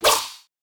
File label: katana gesture